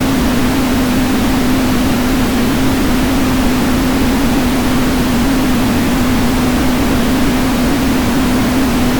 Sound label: storm